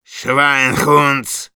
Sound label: Medic negative vocalization, ru